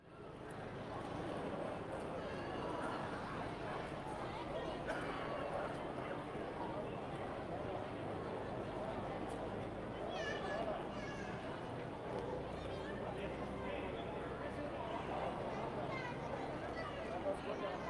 ambience town turistic main square crowd chattering no traffic stereo; sonniss gdc